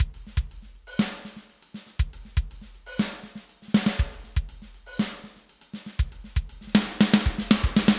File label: snare ghost